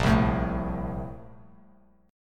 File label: Bb Major 7th Suspended 2nd, Bass Standard; BbM7sus2 strummed; JGuitar